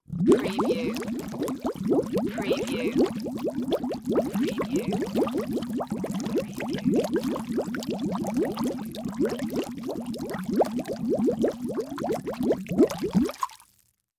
Bubbles Large Long; sound-effects-bible

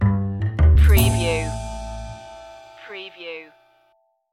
jazzy opener stinger

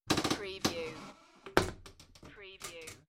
Shower Door Creak; Household Sounds / Bathroom Sounds

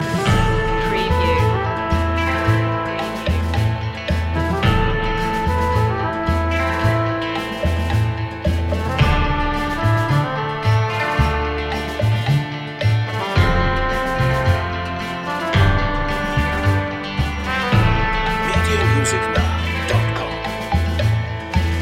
western bravery loop

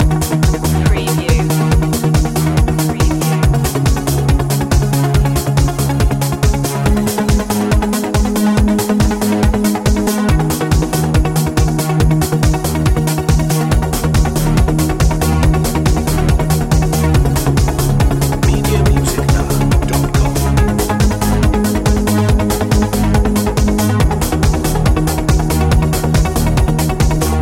mystic loop

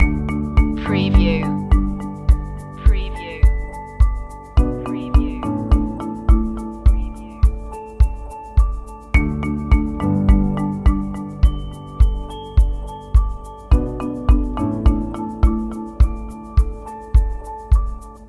bike ride loop

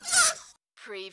CARTOON BALLOON FUNNY SQUAWK

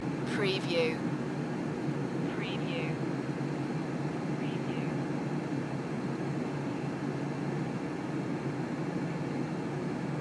airplane toilet ambience loop

